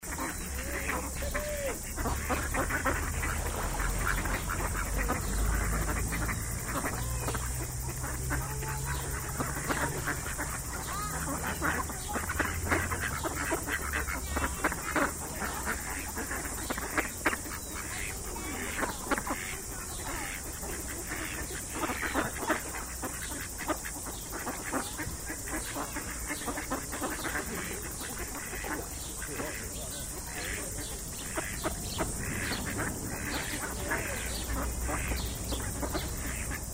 Ducks

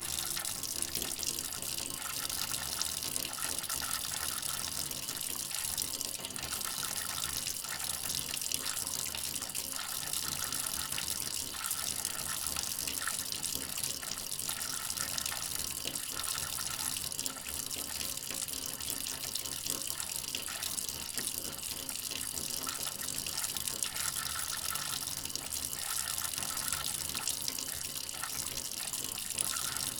Tap Basin, water; Tap 1

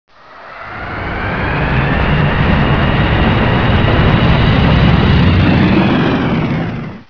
f15 taxi

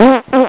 fart

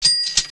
cash register

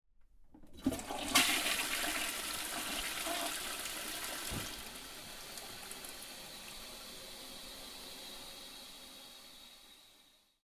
Toilet Flush Full, household toilet

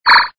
alien noise

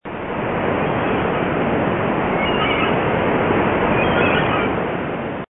beach, seaside

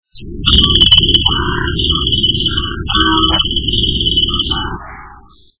construction

